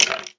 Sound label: skeleton hurt, mob; Eaglercraft; eaglercraft-b1.3